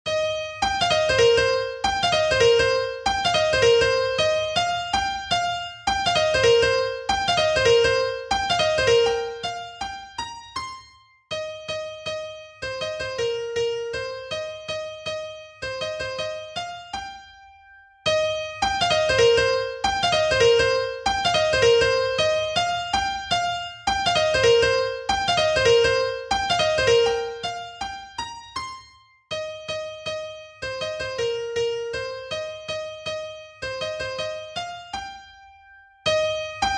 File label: piano